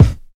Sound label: 90s rap bass drum g key, Royality free bass drum single shot tuned to the G note.